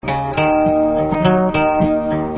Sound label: HILLBILL, GUITARS